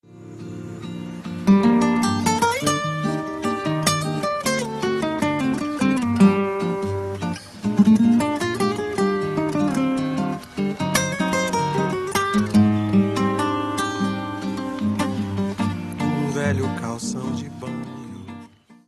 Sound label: bossa